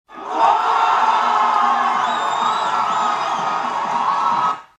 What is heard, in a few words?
goal